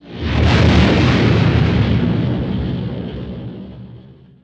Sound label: X-wing; vaisseaux